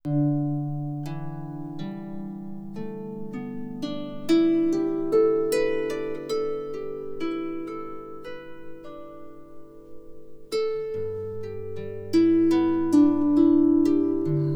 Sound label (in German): Harmonie